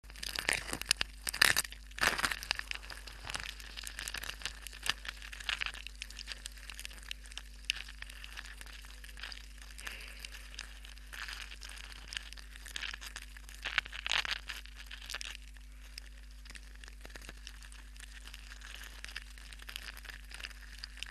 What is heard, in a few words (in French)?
fourmi combat; Stridulations